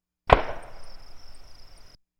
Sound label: enfield; British Enfield No.4 Mk 1; 300 meters; Vigesaa Productions